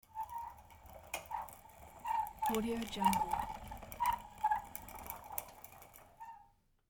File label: pulling squeaking plastic toy car